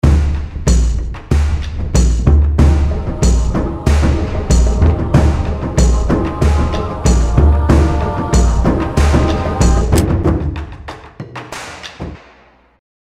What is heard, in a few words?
cinematic